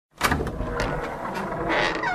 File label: basement door open